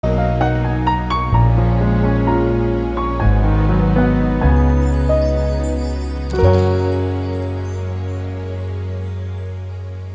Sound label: shutdown; Nokia X